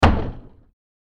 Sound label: cellar door open no bounce; hollywoodedge